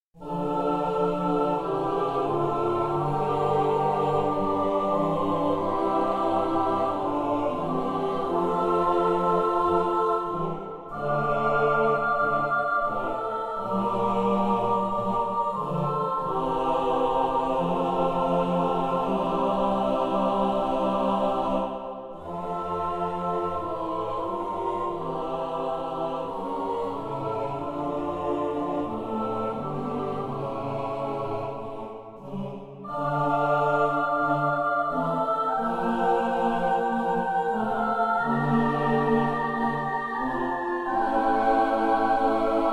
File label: Choir